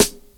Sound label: present snare one shot g sharp key; snare drum